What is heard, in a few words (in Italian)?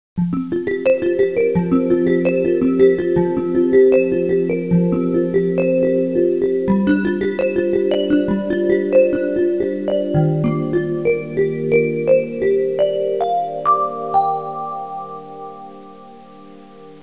suonerie musica pop